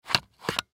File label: Camcorder Battery, Camcorder Battery Remove From Compartment; Sony Mini Dv; Camcorders; Blastwave